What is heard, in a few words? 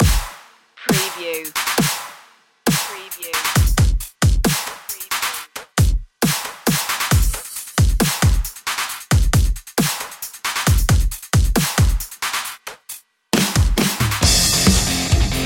metal meltdown no sfx no vocals